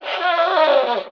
nose; BESTSHOT; noisybit

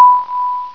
keno bell

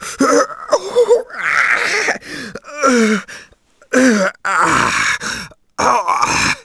moan; shadman

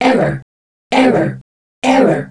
error; StarSiege

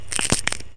crunch; Bugs

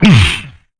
menu command; Quake III Arena; Grunt

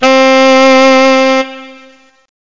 saxo-bits, c4, keyboards; Zoom - Release 2; Active Software

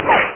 max speed; greeblies

